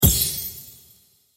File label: upgrade Win